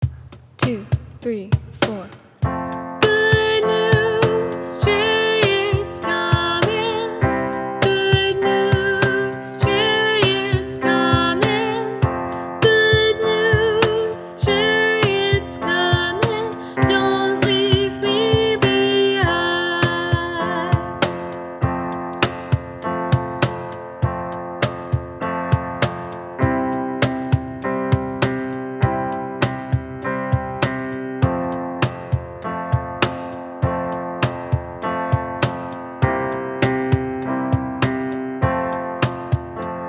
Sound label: d r m Good News F 100 bpm